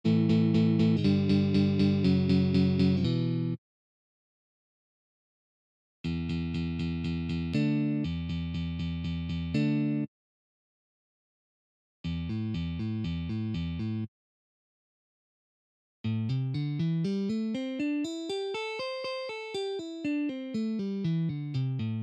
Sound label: palm muting